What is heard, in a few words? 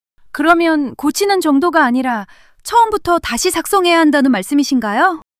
surprise